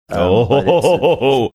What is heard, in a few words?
oh ho ho ho ho ho